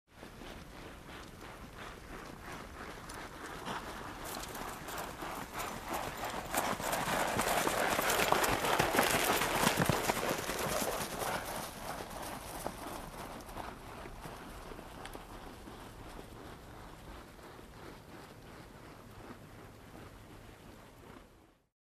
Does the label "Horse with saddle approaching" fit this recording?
Yes